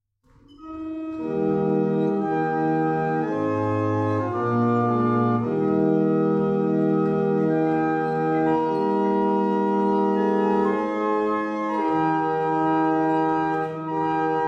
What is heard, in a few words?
barrel organs